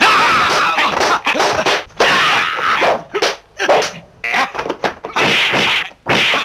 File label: martial arts